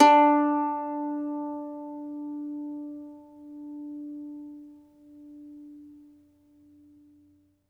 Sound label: MANDOLIN, Plucked Strings